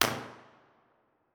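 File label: tunnel entrance e 1 way mono, impulse response, Innocent Railway tunnel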